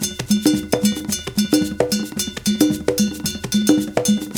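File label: 108 bpm, 2 conga bell; Spectrasonics; Supreme Beats; African Contemporary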